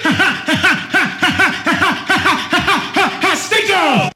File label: ha ha ha haha, ooh ahh; Voice